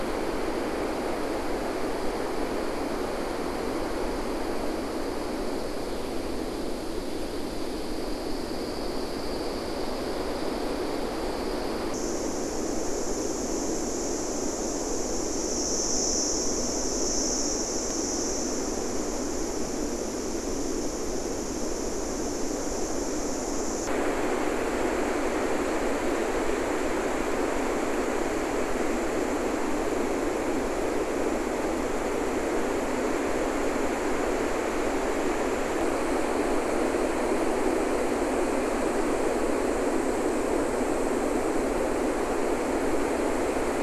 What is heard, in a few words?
WFR audio emfisis